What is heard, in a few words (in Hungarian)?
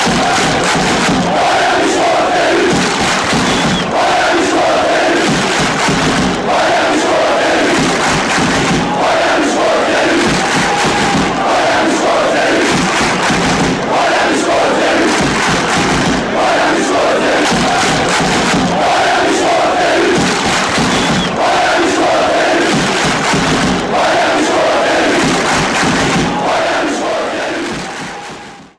hajra miskolc fanchants